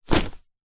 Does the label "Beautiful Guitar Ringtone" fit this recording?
No